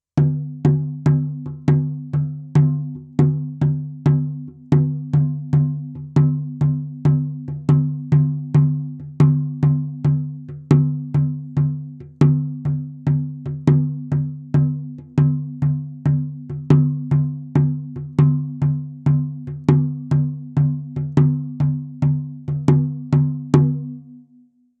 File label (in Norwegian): SOUND, sjamantromme med geiteskinn og treformet handtak; sjamantromme med geiteskinn og treformet handtak 45 cm